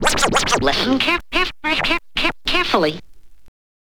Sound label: scratch short, Vinyl scratches